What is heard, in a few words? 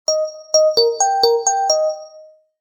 tone, ring